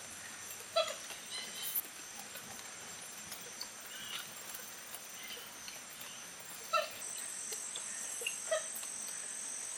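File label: Porphyrio martinicus, bird; گالینول بنفش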